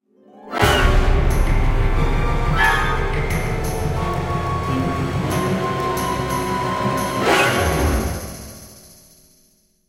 Terror Sting; Jurassic Park The Game

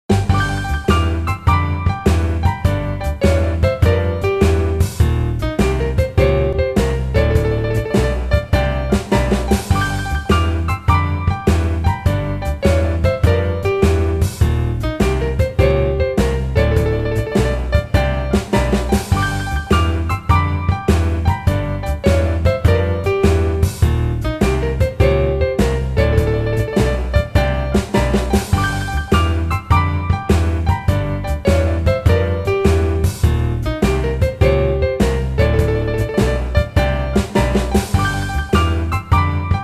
Bomb Exploded!; wii party unofficial soundtrack

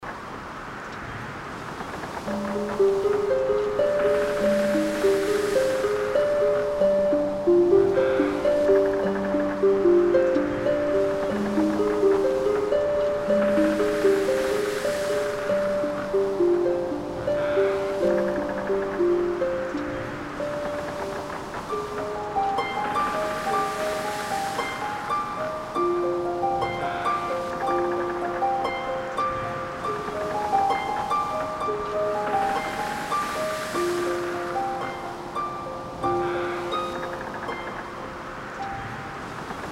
sea ship; Royal Bounty HD

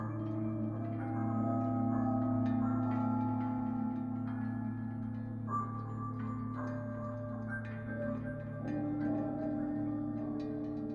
Aware Loop; Void Bastards

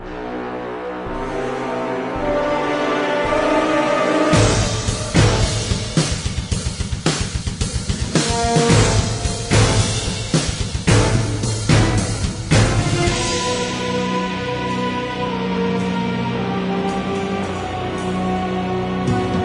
Music Menu; Forest Puzzle; psp

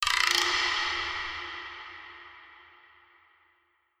Percus

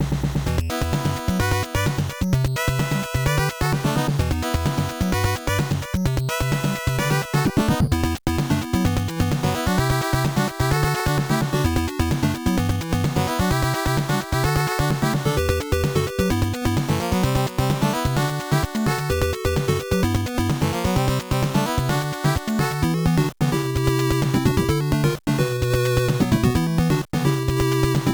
Stereo Stage BGM; NES

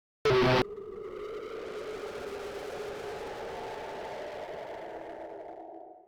screamer layer; Joe's Diner

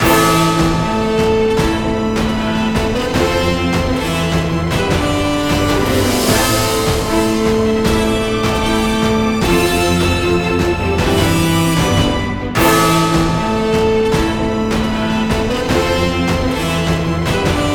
Music Dungeon Win Loop; lego brawls gamerip 2022

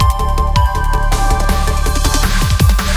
Snow Transition; sky rogue

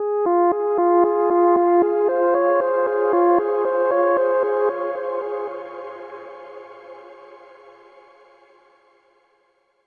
Overlay Tone; The Shard

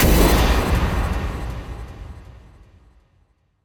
Sting; Void Bastards

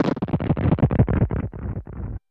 Fireworks

